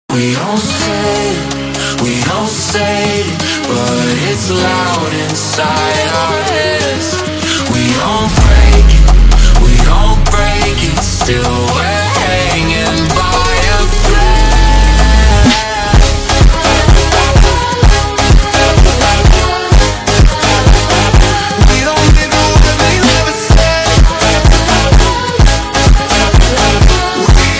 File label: Funk - Slowed